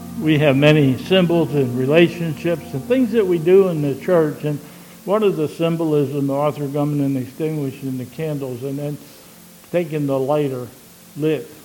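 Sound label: Benediction and Closing Chorus, church service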